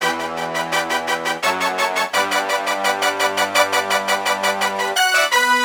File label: Horn Celebration Horn